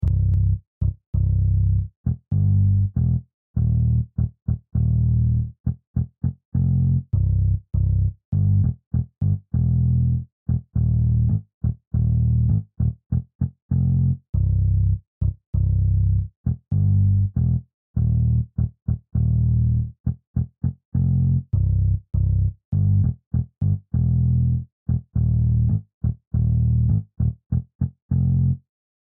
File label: Dub Bass